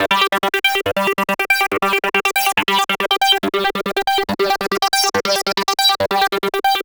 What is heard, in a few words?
Arp 140bpm G, Trance